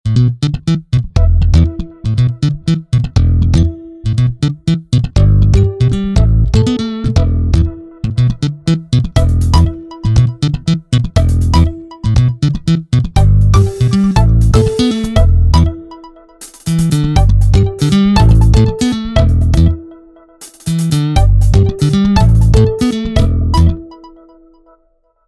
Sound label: Funky Bass, ringtones; LG Stylo 4 Plus